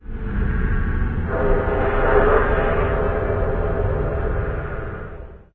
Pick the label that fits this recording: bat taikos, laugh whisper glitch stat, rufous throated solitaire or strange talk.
strange talk